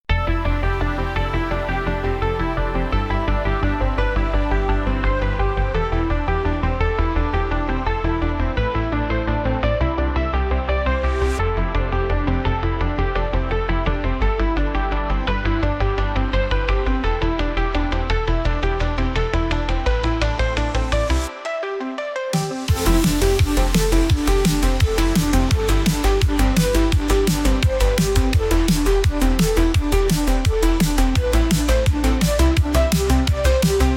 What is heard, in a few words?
synthwave; Wellenreiter